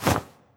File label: sprint rustle